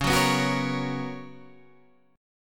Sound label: B Major 7th Suspended 2nd, chord